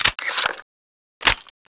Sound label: generic reload, weapons; cstrike